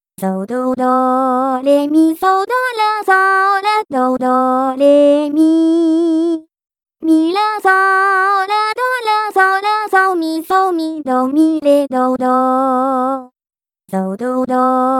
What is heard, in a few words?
Doremi; midi traditional vocal; Akatombo